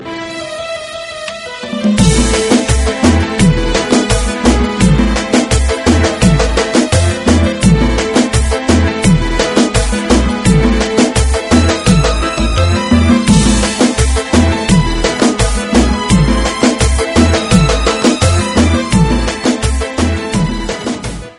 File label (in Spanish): cumbia; tono de llamada; Para Elisa